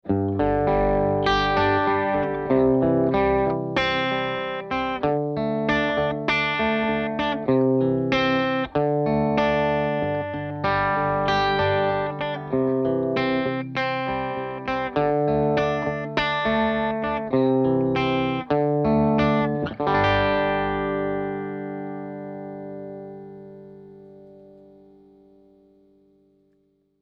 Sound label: light od chords, guitar